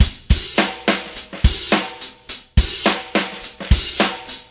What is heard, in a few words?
solidfunk, 105 bpm, drum loop; 101 to 110 bpm